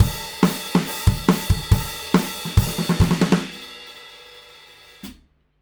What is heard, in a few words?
Drums Rock Ending, loops; Alex Sanfilippo